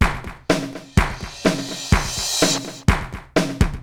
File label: 80s delay Akai XE8 (125BPM), cyborg beats